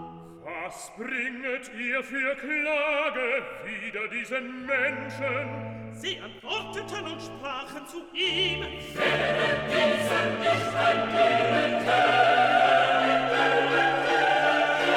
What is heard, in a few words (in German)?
SOPRAN, Stimmen mit Klavier; Johannes Passion